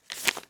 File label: Paper Flip